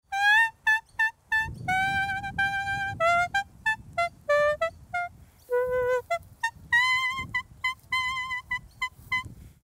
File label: gumleaf